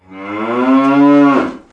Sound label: Cow Moo; Animals; PFx Brick